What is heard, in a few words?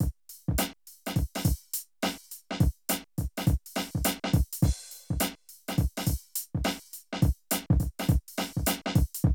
Drum loops, Streetsoul; Zero-G Groove Construction (1993)